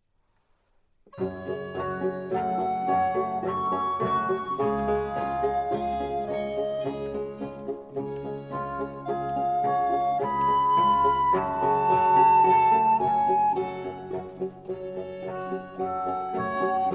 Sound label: Flute Concerto in G Major, Largo; Vivaldi; Concerti da Camera